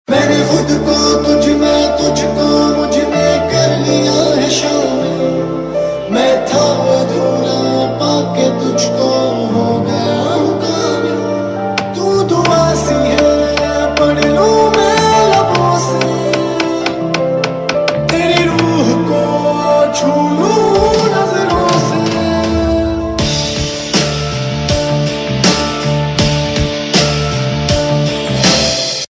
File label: (Male And Female Version), Bollywood Punjabi Pop; Iss Pyaar Ko Kya Naam Doon - Ek Baar Phir; Star Plus